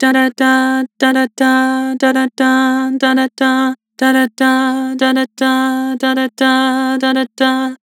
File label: Vibrato "Dah", Vocals; Harmor Vocals